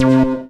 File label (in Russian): knopka cifrovoi odinochnii korotkii v prostranstve